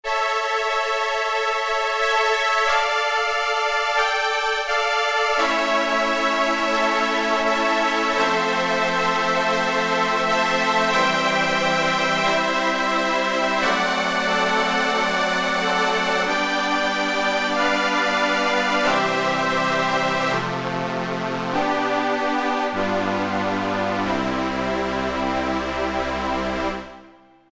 VinStrings, Alesis Z2 EuroDance

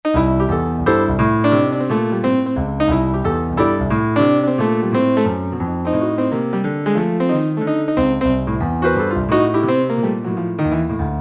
PIANO, Korg DSS-1

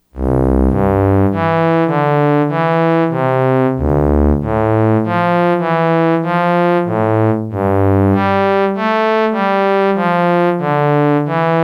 Silk Cello, Yamaha DX100; Factory Patches

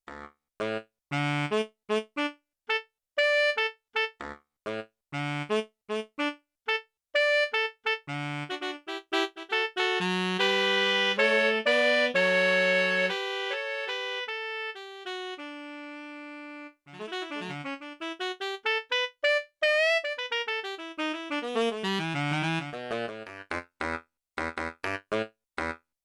Quartet, Four Saxes, Yamaha TX16W

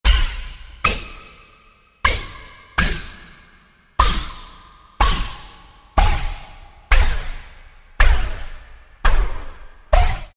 Simmnz, Korg DSS-1, Industries

